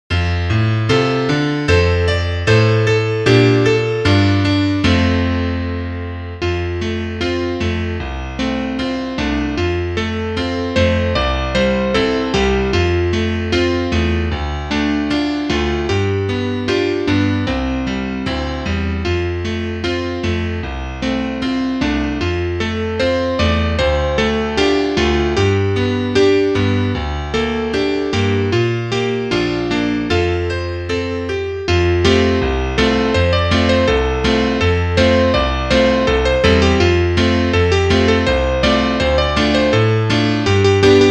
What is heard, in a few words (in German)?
Klavier Solo, trompete; Piri Sori